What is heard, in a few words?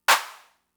Clap, Fricke MFB-522, Drum Machines